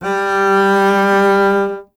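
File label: string contrabass G; Miroslav Vitous; Solo Instruments VOL-2; Solo 2 Bank 1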